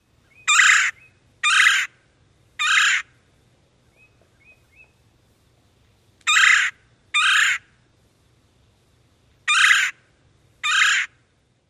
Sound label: querr calls